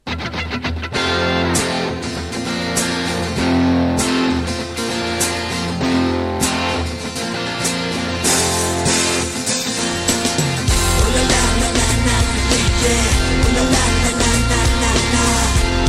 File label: guitar